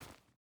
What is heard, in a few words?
footstep boots forest jump start; Rust